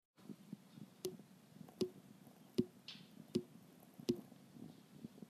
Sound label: Metallic Sound